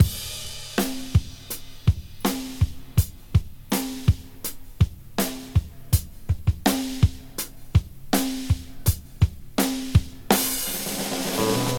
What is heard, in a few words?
82 bpm drum loop f key